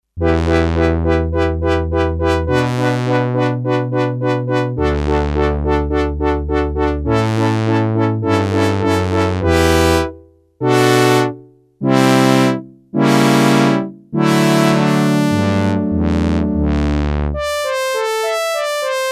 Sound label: Brass, Sequential Circuits Six-Trak; Factory Programs